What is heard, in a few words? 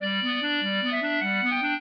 clarinet; Mozart